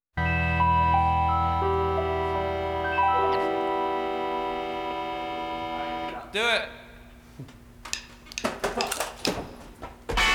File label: Blues